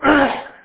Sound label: throat clear